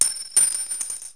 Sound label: two drop; Wired for Sound Pro CD; Aristosoft, Inc.